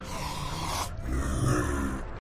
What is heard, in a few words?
IceBear Stun